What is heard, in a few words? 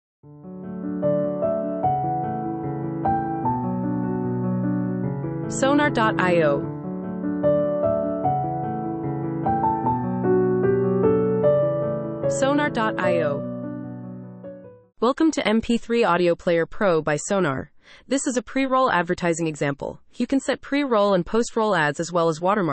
The Piano Intro